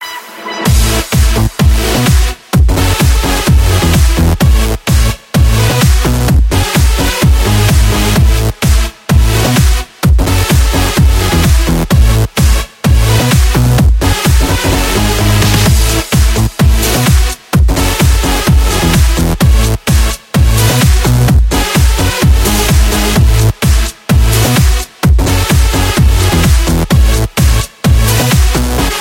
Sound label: Dance Ringtone